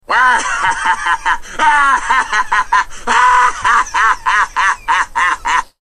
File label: laugh; wario